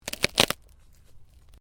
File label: Ice: short crack of ice under pressure; Snow; Weather